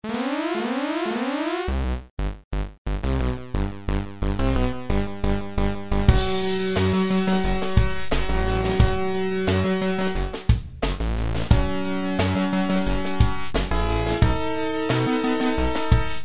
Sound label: 8bit ending; neuromancer